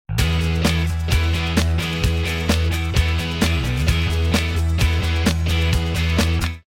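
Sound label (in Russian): динамический рок теплый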